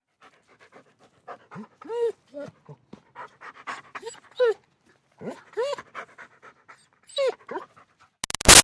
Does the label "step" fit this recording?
No